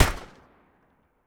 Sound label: MP40 Single Shot; Jagged Alliance 3